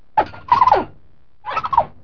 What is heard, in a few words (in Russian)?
Писк и щебет белки; Звуки животных